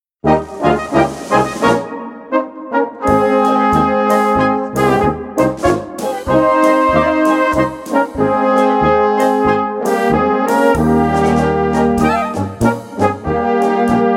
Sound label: polka